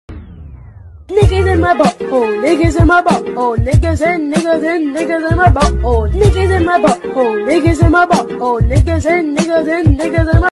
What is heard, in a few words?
good music